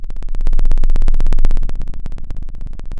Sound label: Sound of an engine revving